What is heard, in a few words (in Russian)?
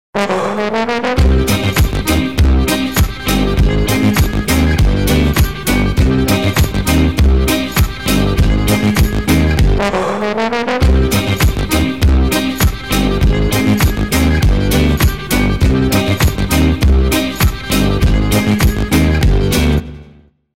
truba muzyka; muzyka-dlya-signala-budilnika